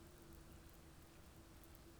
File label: pulses, BALAENOPTERA; MONACO 2022-07